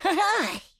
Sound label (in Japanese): ハハァ！